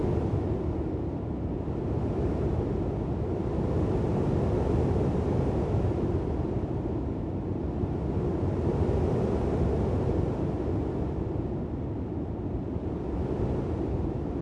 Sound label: ambient battle; World Of Warships